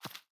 grass land; CrossCode

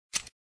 click

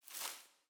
plant shake; cross-code

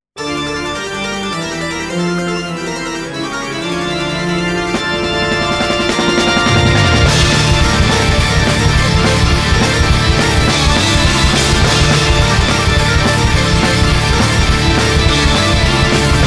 continue, music; perfectfighter; flashkof

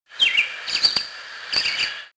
bird; Solitaire

